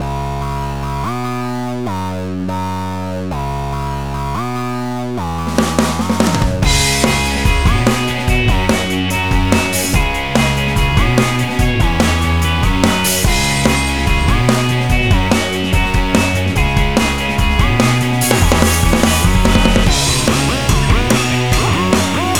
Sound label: Rock LONG, ringtones; Google; NewWave Labs